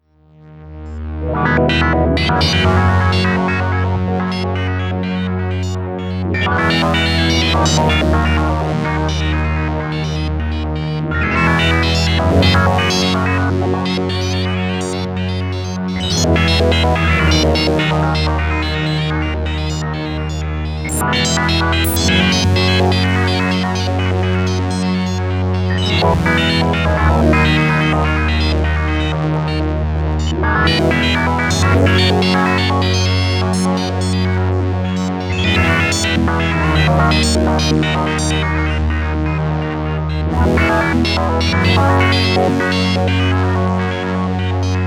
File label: MemoryMoog Sample Hold